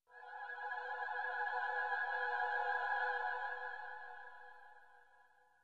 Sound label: Bright choir vision sound effect